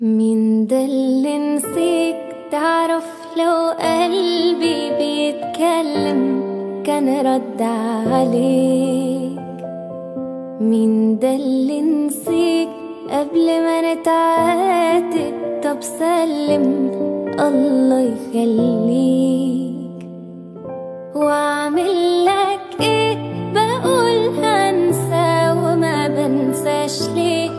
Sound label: Piano